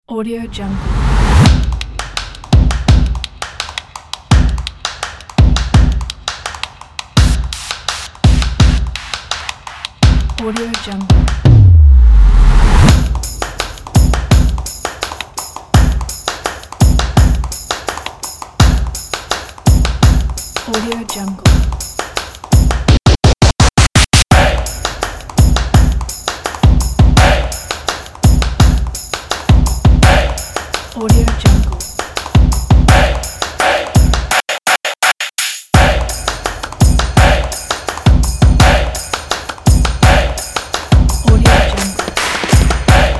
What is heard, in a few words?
stomps claps hey